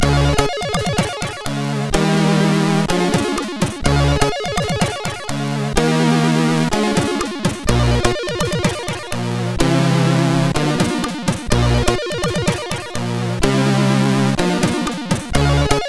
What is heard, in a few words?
ingame, MOS6581R4; sid; Flummis World